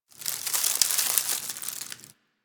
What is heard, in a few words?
Derelict Tree Crackle; Warframe; Orokin Derelict